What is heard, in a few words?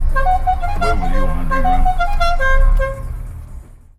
Novelty Horn